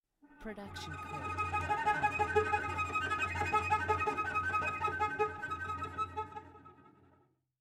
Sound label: Cello Scary Movie; SoundsCrate